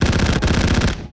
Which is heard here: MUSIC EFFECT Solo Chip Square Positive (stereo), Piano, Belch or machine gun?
machine gun